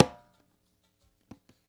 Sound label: Percussion, House